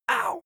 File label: male hit soft; menschen; schreie